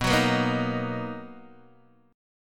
B Major 7th B down Guitar DADGAD

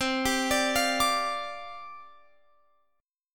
C Suspended 2nd Suspended 4th C Guitar Open F; arpeggiated; Csus2sus4; x,19,19,21,20,21

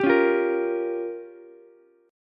G Minor 7th Flat 5th, Ukulele; Gm7b5 strummed; JGuitar

